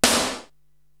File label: SD Processed, Korg TR-Rack drum machine